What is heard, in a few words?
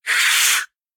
biter roar short; factorio; Factorio 0.14.21; creatures